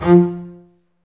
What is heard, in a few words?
STR, STRINGS; Roland - LA Composer vol. 1